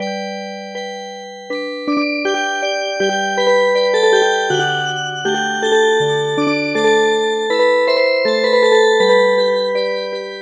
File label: Music Box